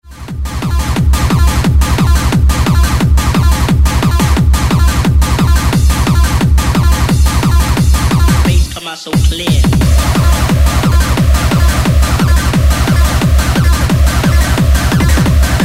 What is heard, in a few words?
bass; mirakle tracks vol1; taksun